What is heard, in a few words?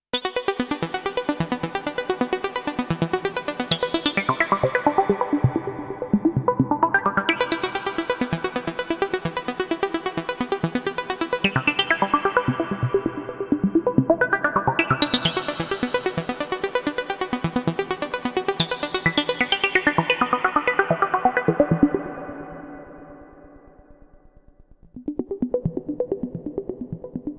nice arp with reverb